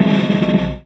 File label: Loop, Chamberlin Rhythmate; 300 Drum Machines; Processed